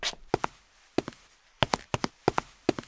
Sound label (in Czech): kroky; Mafie 1